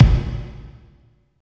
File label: KICK, Tekno Industrial; East West